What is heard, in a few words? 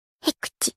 cute sneeze